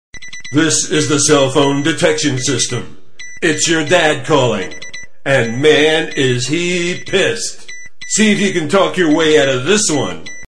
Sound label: ringtone; dad calling